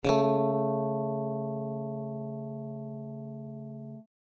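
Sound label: string A tone C sharp 4, elec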